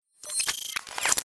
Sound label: nanotech complex short reversed robotic robot cyber tech nano micro reversed; PMSFX